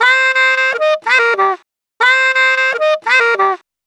Sax 120bpm Ab, Saxaphone; Quazibeat; African Secret

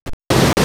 Nature River; X-Static Goldmine

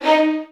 VN FX, Virtuoso Strings

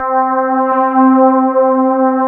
electric pianos; Wizoo Electric Pianos

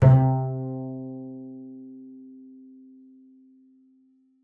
PIBF C3 -L, BASS PIZZ; BASS PIZZ 2; Xsample VOL-5

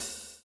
SWNG, 85 SWING

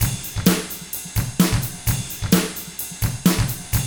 HARD ROCK, Drums

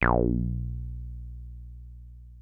303 A1, TB 303

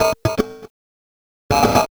Distorted Beat; Sonic Foundry (Sony Creative Software); Loop Noir

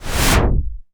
cinematic impact wipe

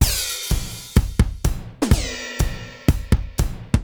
Akai XE8 (125BPM), beats

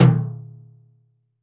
Lite Tom, Roland BK-5

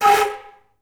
FL FX, Orchestra; Best Service; Peter Siedlaczek's Advanced Orchestra

